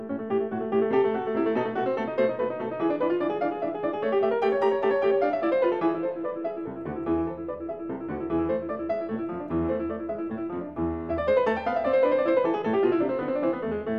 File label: Keyboard Sonata in D Minor